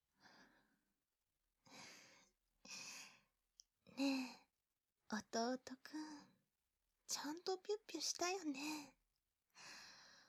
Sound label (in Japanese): 耳舐めと生えっち（右）